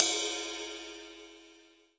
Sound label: RIDE, MONO RIDE CYMBAL; AKAI S6000 CD-ROM - Volume 3